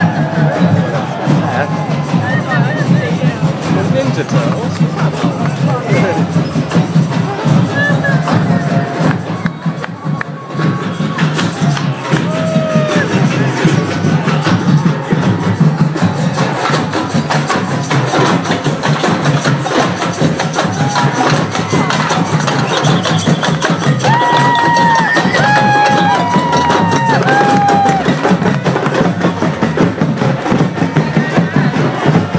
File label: bonfire drums